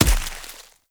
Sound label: Snow Ball Impact; Warframe